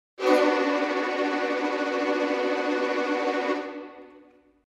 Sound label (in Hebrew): כינור טרמולו